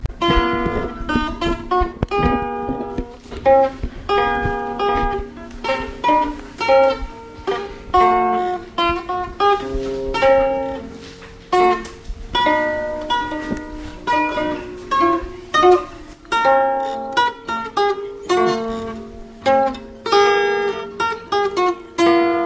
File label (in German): Musik Senioren; Zeiningen AG